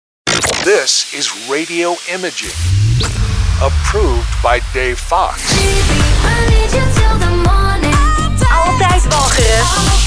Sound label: branded